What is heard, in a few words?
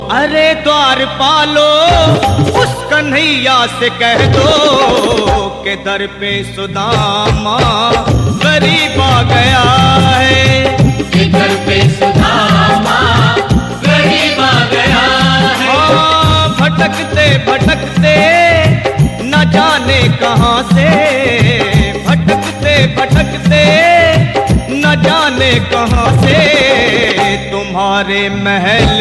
latest bhajan